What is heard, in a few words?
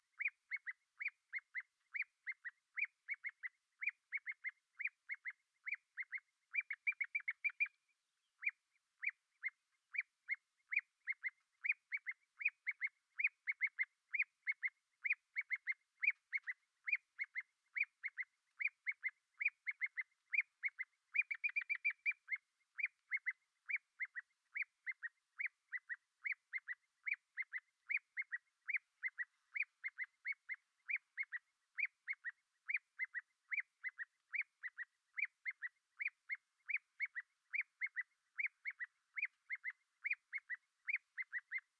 common ringed plover; plovers